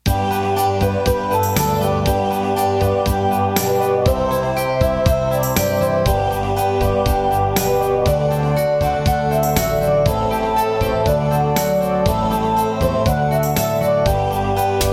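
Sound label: Schlager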